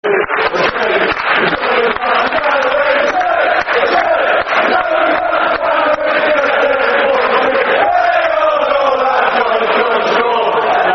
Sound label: O'shea, O'shea Football Chant (Soccer Song); Man United